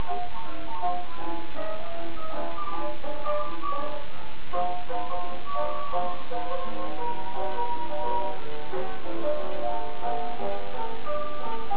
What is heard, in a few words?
Piano; Cephas Fisher